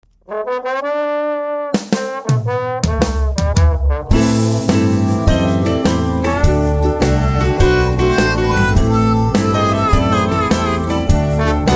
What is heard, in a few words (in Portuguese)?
instrumental